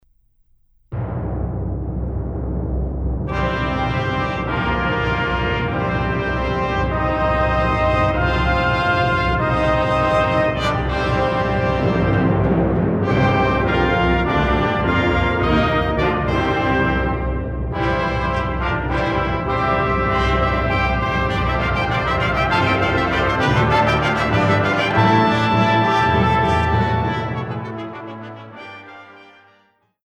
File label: Easter Fanfare